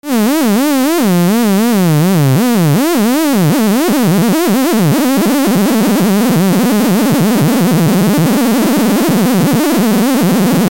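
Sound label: lfo pitch random, synthesizer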